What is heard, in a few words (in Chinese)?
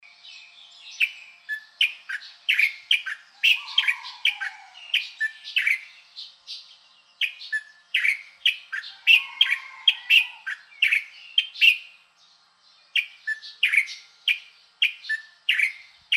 烏頭翁; 台灣鵯; 鵯科/留鳥